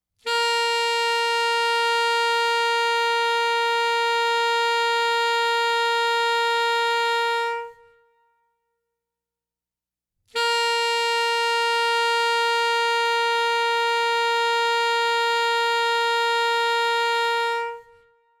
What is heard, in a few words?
Tuning Note - A. Sax